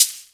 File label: SHAKE; Akai MPC500